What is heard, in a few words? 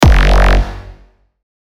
hit; maze python; 打boss动画